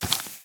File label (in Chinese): 脚步声; 尸壳